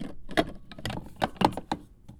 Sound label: Plastic Thump; BB Retail Therapy Sample Pack